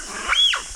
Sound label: C diana LAf, dianas